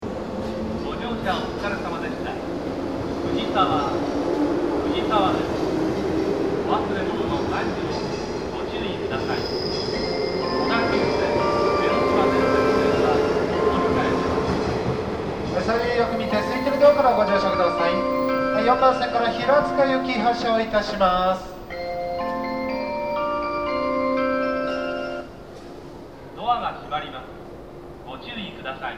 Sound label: melody